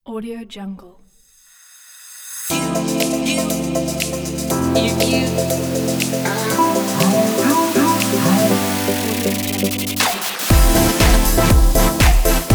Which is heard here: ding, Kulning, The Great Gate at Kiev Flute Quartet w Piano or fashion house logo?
fashion house logo